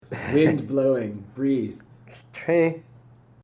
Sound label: wind blowing breeze